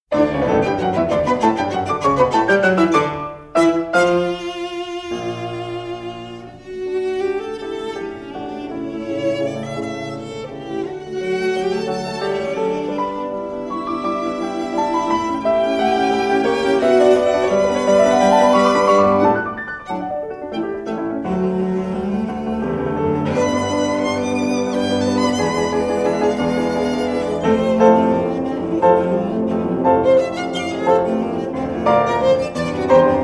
Piano Trio in D Major, Allegro Vivace e Con Brio; Piano Trio No. 5; Op. 70 No. 1 Geister-Trio

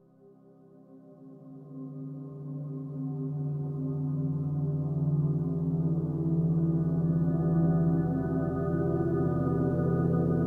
String Quartet

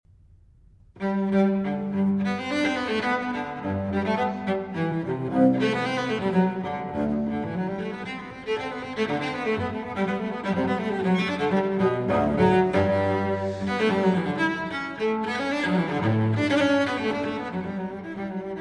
Cello Suite No. 1 in G Major, Courante; BWV 1007

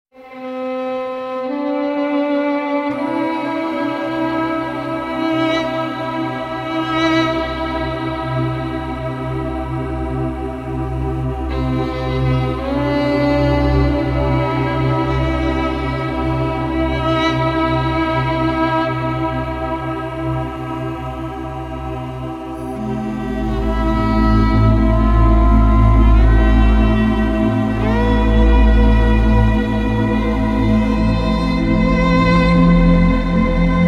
432 Hz

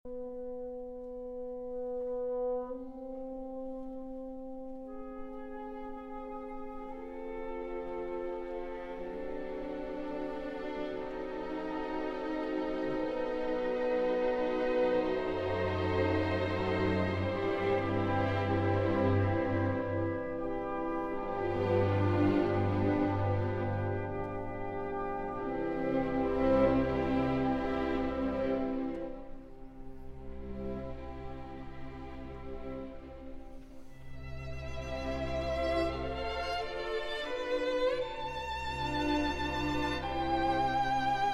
Violin Concerto in E Minor II. Andante

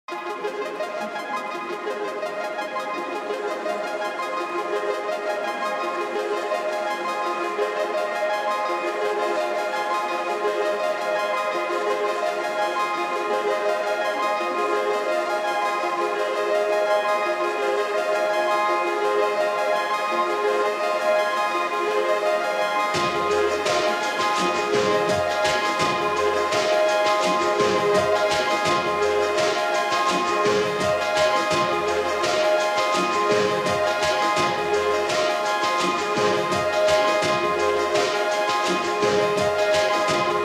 Arpeggios

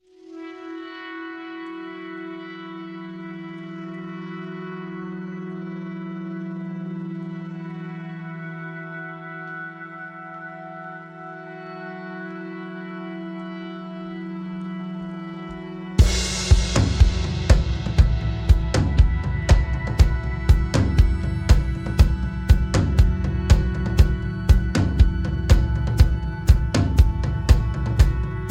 Haunting Echo

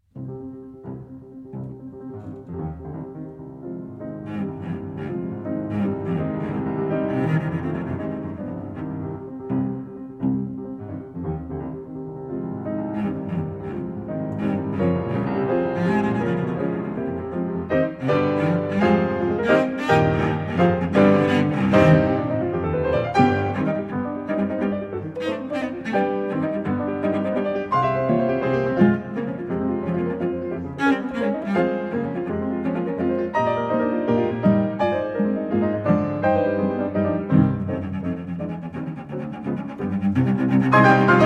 Cello Sonata in G Minor, Allegro Scherzando

